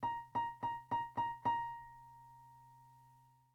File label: Theme Fm Bb; DeadFright